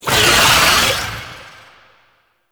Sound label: Wall Climb Impact Vocal; Warframe; Oraxia